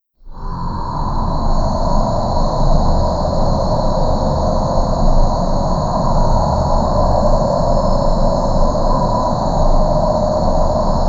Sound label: web loop; weapons; dota; broodmother